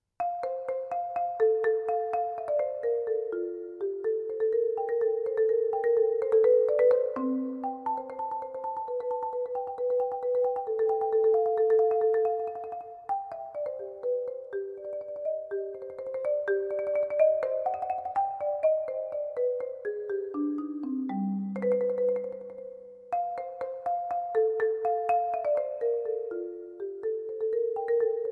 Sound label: keyboard